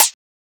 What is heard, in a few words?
Closed Hat; FLPRO